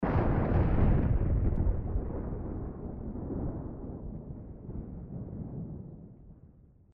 Thunder Medium; zps; Ambient

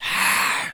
Zombies Male Jump; zps; Male1

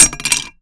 Machete Collision; zps; Foley

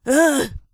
pain, Zombies; zps; Vanessa

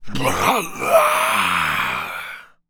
Berserk, Zombies; zps; Carrier

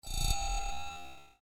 metal scrape; zps; Ambient

